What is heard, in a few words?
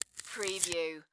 Celery Peel Off Stalk